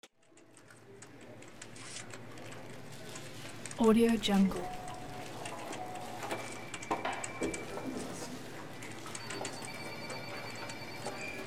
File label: quiet office ambience